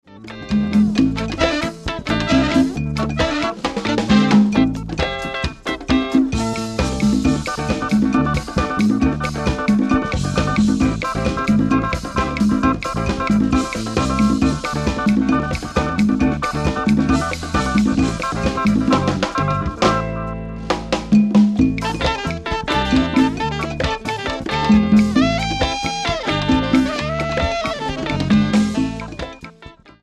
Afrobeat Orch (inst)

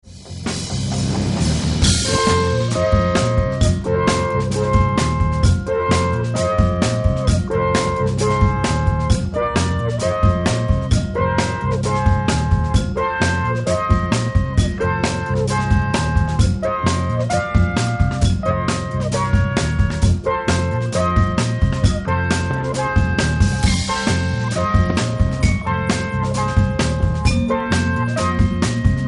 didjeridoo

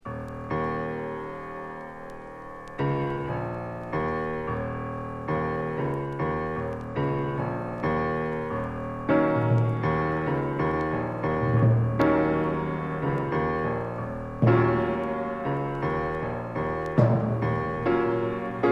Me And Some Drums

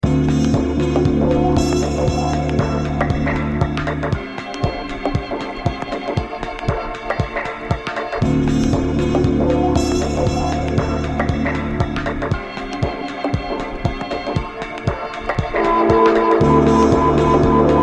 ambient

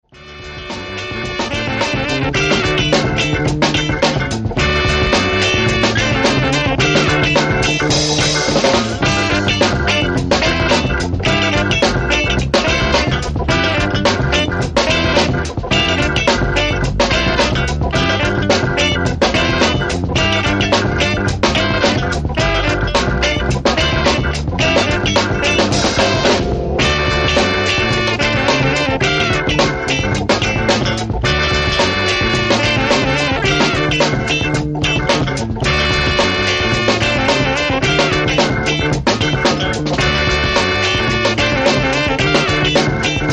Groove

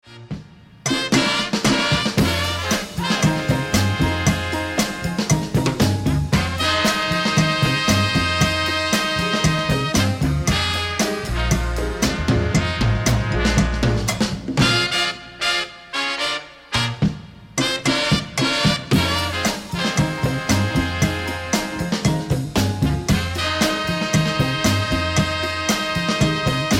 Jive Samba

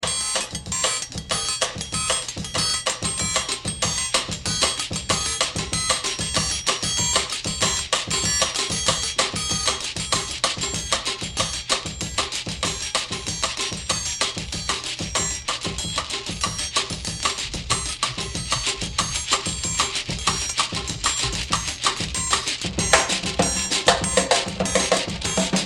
Bossa Nova afro rhytms

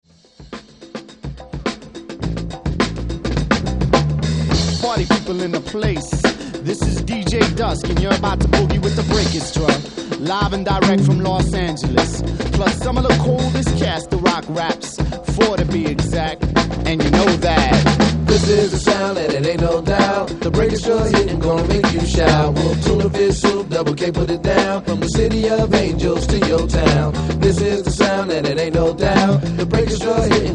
Beats & Bass (vocal)

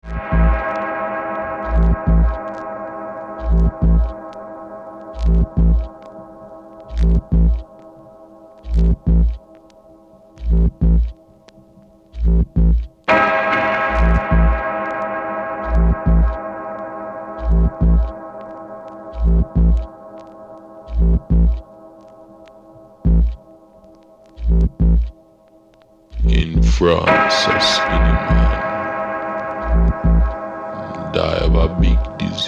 sine

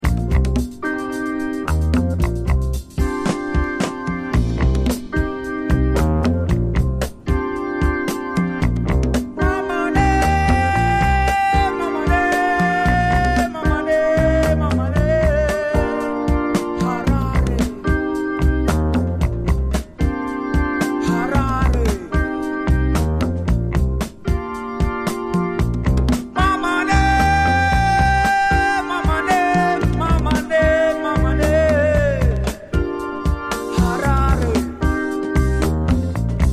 harari beat

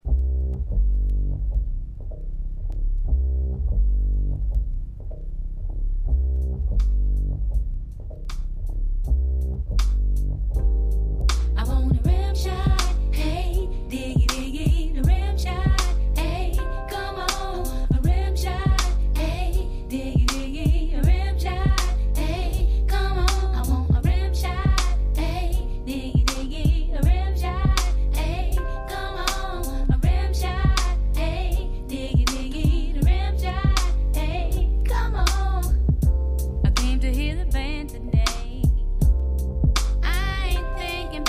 rimshot

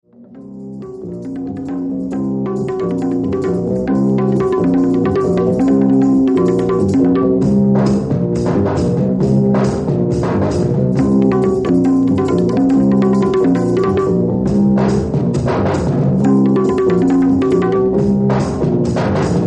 Dancing Drums, sitar beat

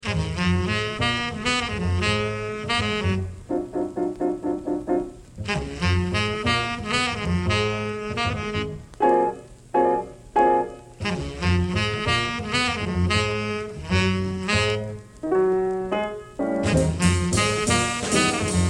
Bass Blues

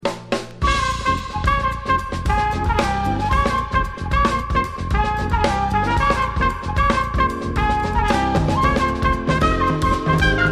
Spanish Raga

